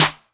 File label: clap one shot d sharp key; percussion; single hits one shots